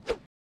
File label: Mini Swing